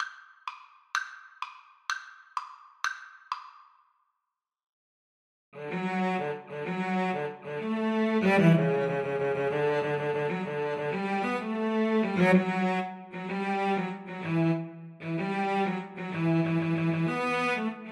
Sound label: Cello Duet